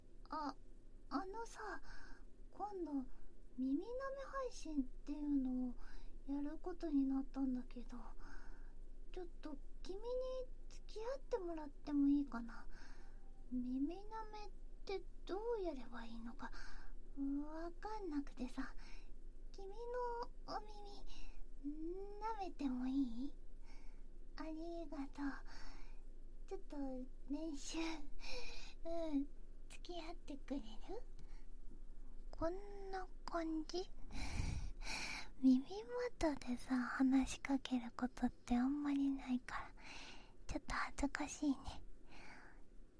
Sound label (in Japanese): 耳舐め ASMR; 彼女の耳舐め配信練習に付き合っていたら勃起しちゃって生交尾セックス